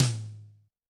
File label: Yamaha DTXpress-II Tom